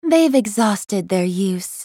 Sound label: attack; AhriSkin04